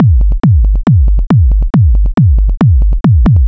Kick Loop, Drums, Dance Beats

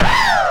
Turntables

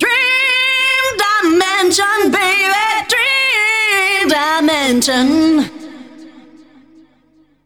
vocals; SoundFactory; SMPMANIA

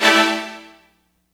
Strings

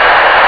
engine; Deathchase; Retro

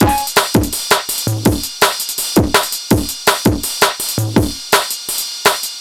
Electronica Drumloop; Drums

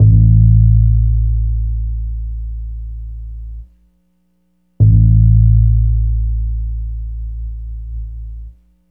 Acoustic Bass, Loops; ACID; Basses

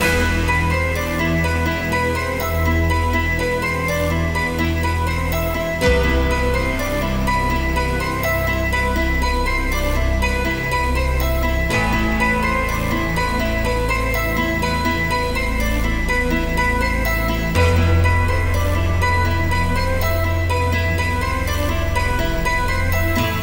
Pad, Synthesizers

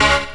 FX ORGAN; SoundFactory

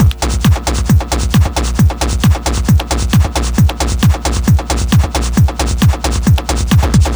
Dance Drumloop; Drums; Dance Beats

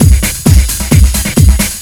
LOOP; SoundFactory; DRUMLOOP

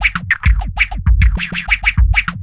Old School Break, Drums

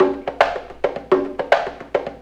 Acoustic Percussion

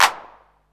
CLAP; SoundFactory; SMPMANIA

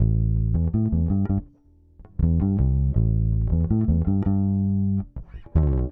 Electric Bass; Basses

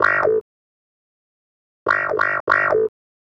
Electric Piano, Loops, Keys

